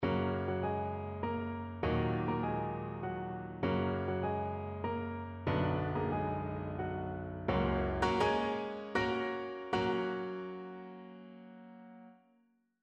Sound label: cadences